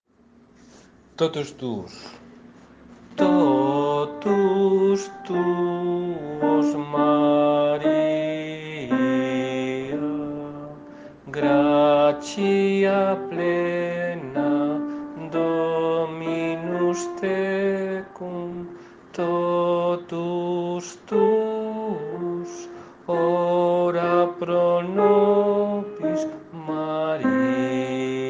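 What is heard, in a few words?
alto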